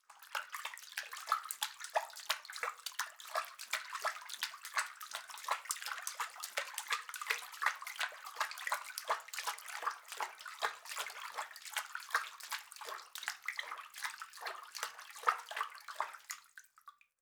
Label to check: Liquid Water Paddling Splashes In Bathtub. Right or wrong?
Right